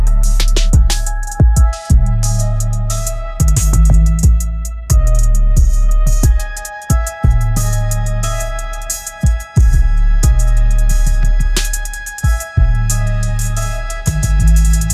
instrumental; ai

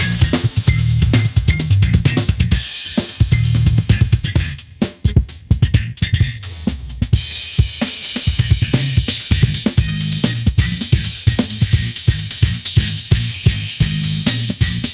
funk frenzy groove machine; ai

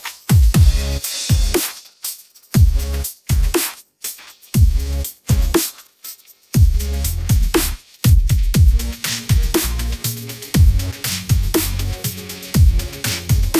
bright pop energy instrumental

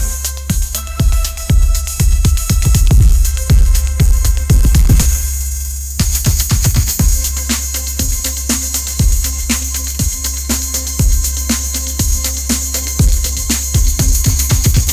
sunshine pop groove, ringtones

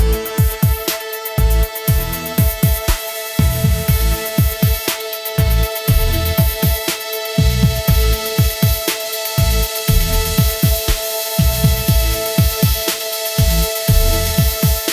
sunshine pop instrumental groove; ai

